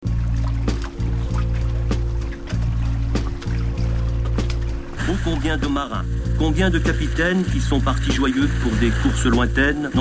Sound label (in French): harpe marine